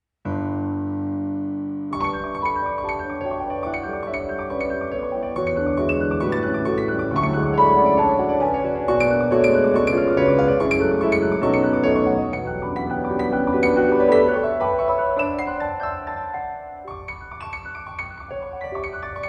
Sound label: Jingle Bells